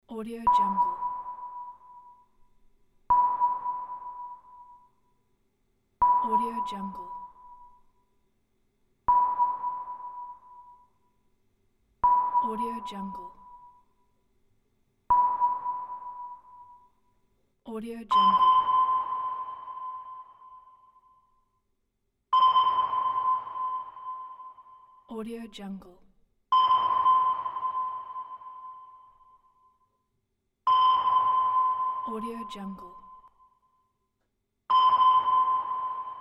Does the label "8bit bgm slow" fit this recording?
No